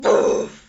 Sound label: death effect